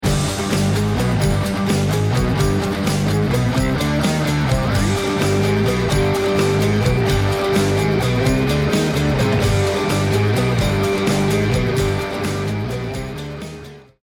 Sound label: start action, music; hades